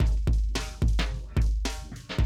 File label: 110, Classic Drum Loops